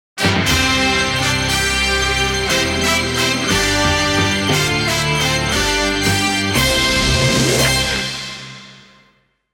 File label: Fanfare short; IIHF